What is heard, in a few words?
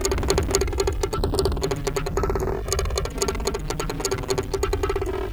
bounce 090bpm; Zero-G; Downbeat Dance Grooves